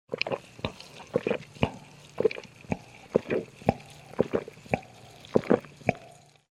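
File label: sounds of swallowing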